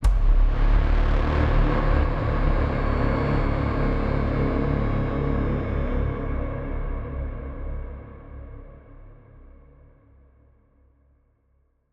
Braam C; All Lights Out

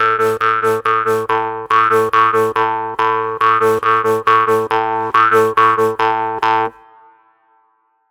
Jaw Harp

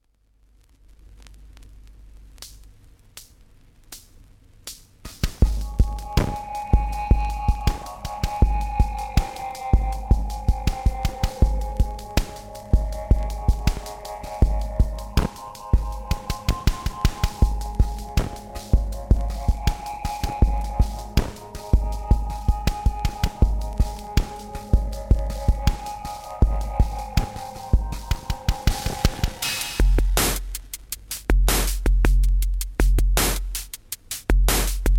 Damaged Drums; Kontakt instrument; Rhythmic Robot Audio

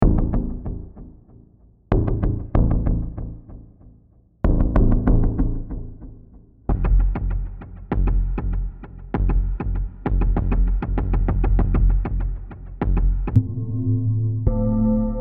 Tonal Percussion

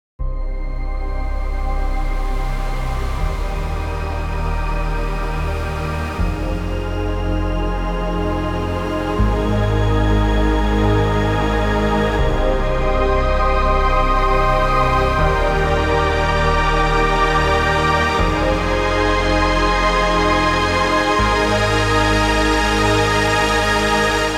TEXTURE Minimal tonal

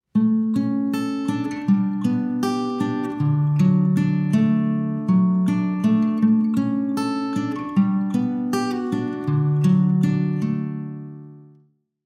Guitar Echo Chamber; Outside Records

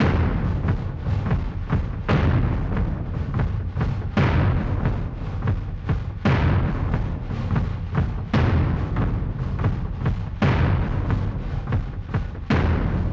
Arc Filter Drums All 72bpm 5 4

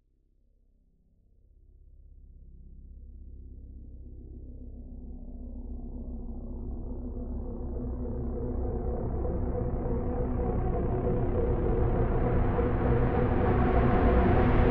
Digital Noise Riser Bm

